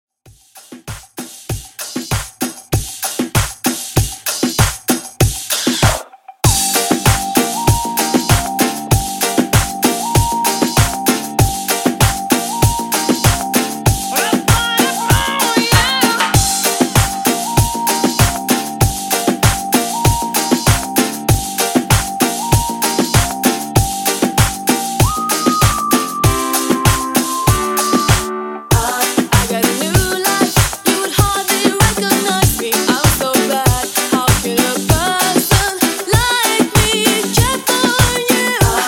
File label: 90s Redrum 97Bpm